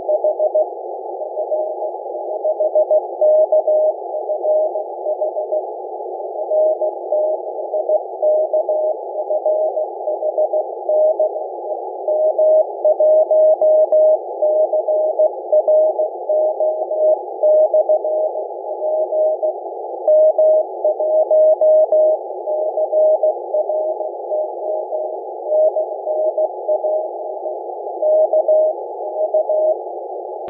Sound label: 3cm cw; contest IARU UHF oct2023; m1cro; f6kfh; f6dcd; rimling